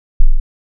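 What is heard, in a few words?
waveform FM sine triangle envelope silence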